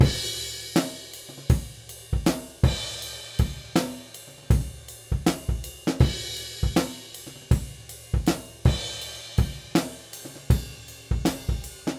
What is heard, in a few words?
Original Kit